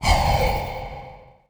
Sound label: menu up, UI; Stake Fortune Fighters; XBOX